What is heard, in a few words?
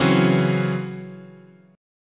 Db Minor Major 7th Double Flat 5th, chord